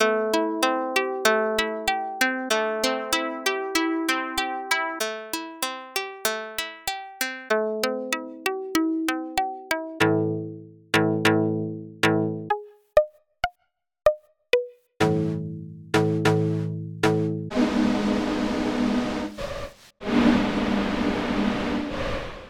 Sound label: synth guitar; plugins